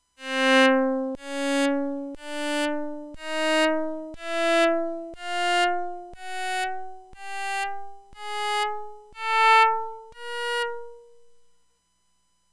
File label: bowed random, KS examples; PIC32; Sound synth